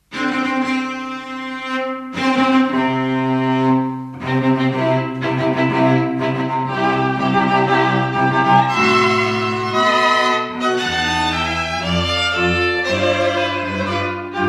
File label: String Quartet Wedding March